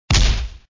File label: Item Hit; moonstone madness